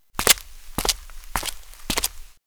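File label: FOOTSTEP A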